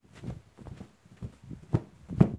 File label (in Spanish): Aparece humo